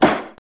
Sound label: Wood Knock; Princess & Conquest v0.20.06